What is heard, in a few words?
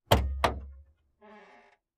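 sedan trunk open; trunks & hoods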